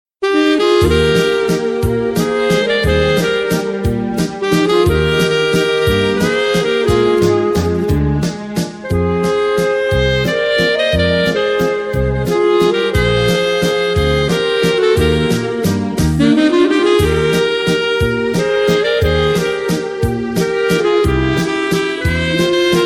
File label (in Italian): doppio sax